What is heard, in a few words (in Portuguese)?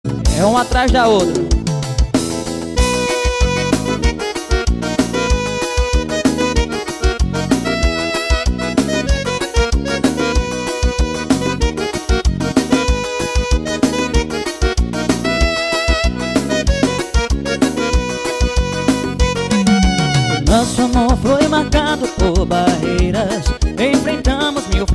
forro; ao vivo no eco park tamandua